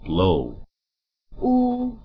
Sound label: blow